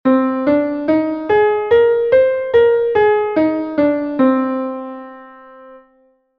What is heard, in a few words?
Minor 2 Pentatonic (19-EDO)